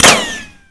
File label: weapon impact metal; Spearhead Demo; MacFormat 2003 June